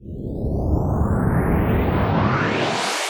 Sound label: cinematic reverse